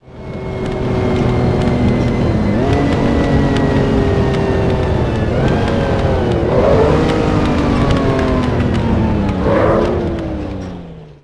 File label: throttle off, vehicles; gmod; tdmcars; lam miura